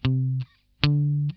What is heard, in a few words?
NOTE SCALE, Funk Guitar; 90 s Sample CDs; Zero G